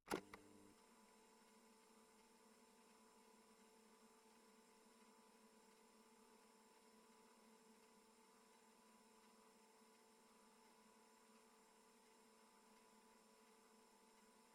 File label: CASSETTE TONE Buttons, play, motor on, long, interior perspective; Tone Manufacture